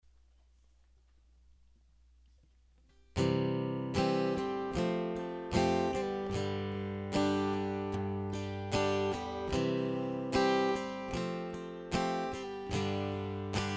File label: Hymn